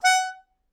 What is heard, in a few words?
Melodica Short; Short Notes Chromatic